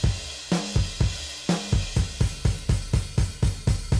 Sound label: drums; musdb18